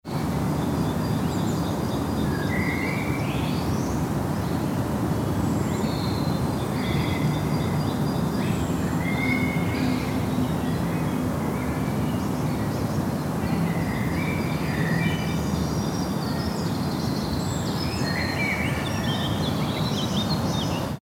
city park atmo

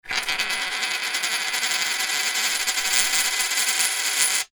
coin spinning

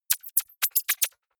electronic bird chirp short

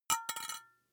glass metal small drop

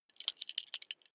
metal belt buckle clatter regular

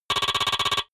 typewriter sequence

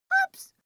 child play jump

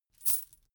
chain mail armor movement

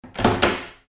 door

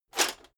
metal plate armor movement single

